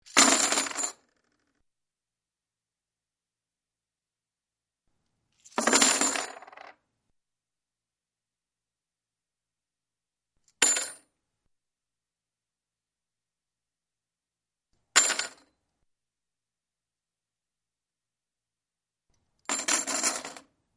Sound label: Coins Drop Many Coins